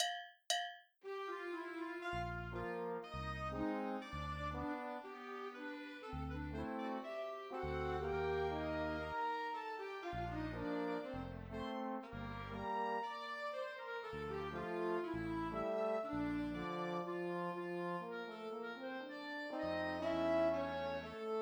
minus Trombone Classical

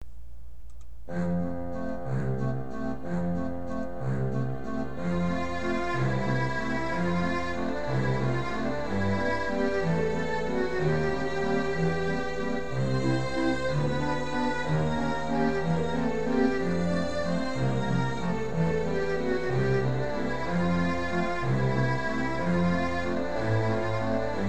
accomp; Sleeping Beauty; Grade 10 Class Exam Solos; Xtras Classical Favorites

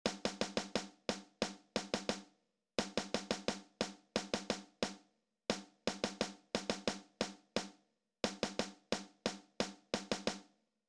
For Snare Drums Only

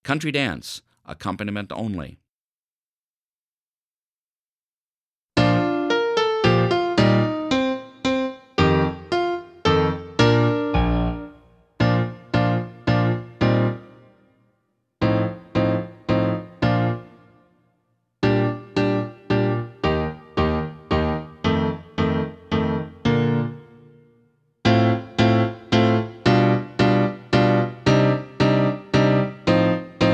Country Dance Acc, Flute; Grade 9 Class Exam Solos

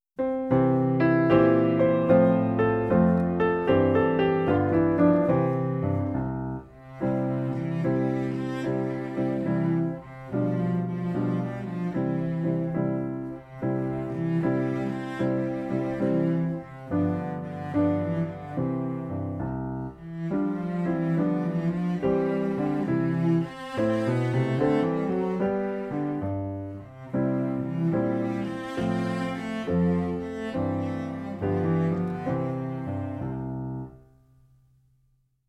May Time Piano; Suzuki Cello Vol 2

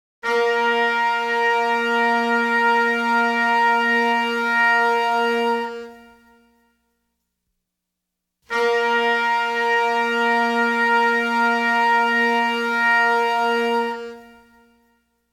Bb Tuning; Grade 10 Class Exam Solos

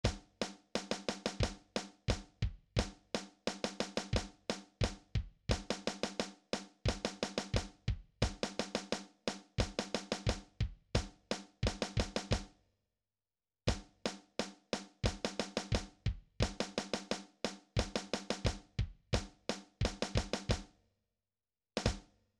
F Major Scale, drums

